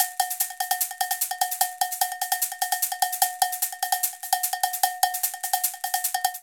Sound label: Latin Jazz Caxixi Cowbell 149bpm; Latin Percussion; Ableton; Factory Packs